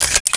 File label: bullet up, ammo; SINDEMO; weapons